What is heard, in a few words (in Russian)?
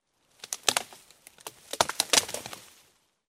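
crackle of breaking branches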